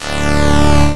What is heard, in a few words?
Synths C; Vengeance Samples